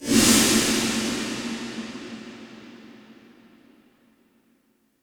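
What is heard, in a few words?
Quad snare drum room; quadraverb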